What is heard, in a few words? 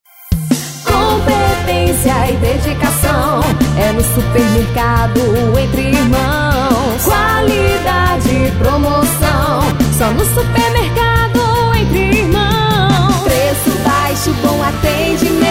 JINGLE, mix; SUPERMERCADO ENTRE IRMÃOS